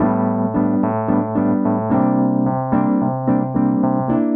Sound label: jazz keys ElPiano 110 B-line n Riff